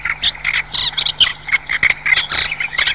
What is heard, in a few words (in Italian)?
Averla mascherata